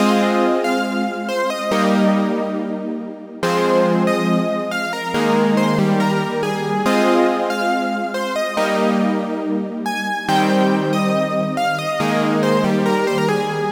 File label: Melody F 140 Wavey, Color Bass Essentials, Melodic Loops; Ghosthack - Color Bass Essentials